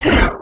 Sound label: Run, creatures organic